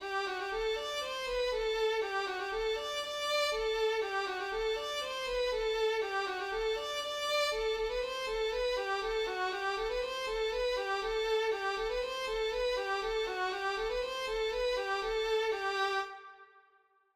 ronde, voix1

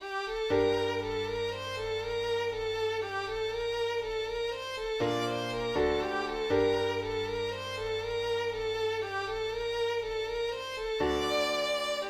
mazurka

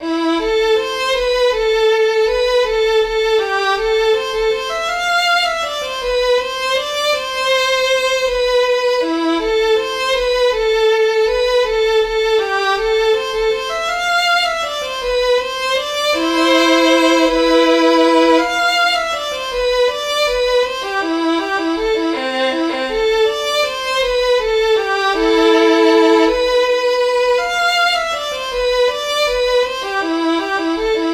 valse voix2

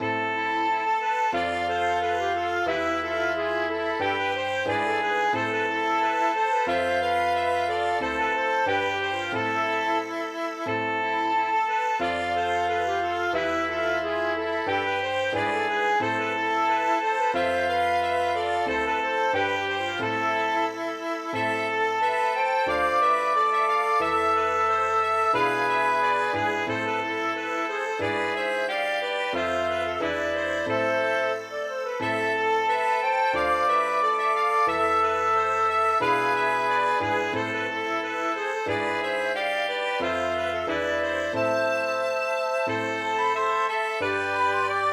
gavotte

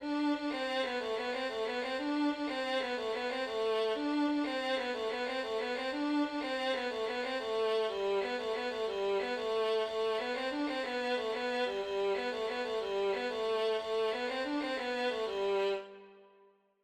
polka, 3 voix

